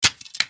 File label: arrow; WarhammerQuest; magic system visuals, audio sfx, and ui polish